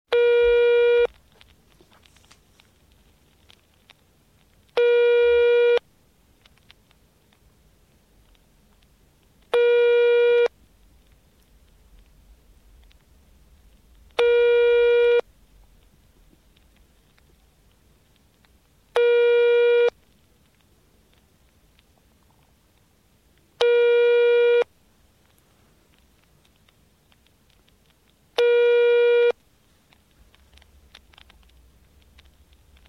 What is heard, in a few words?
Telephone, European Internal Ring And Pick Up; Foreign; Sound Ideas; Series 2000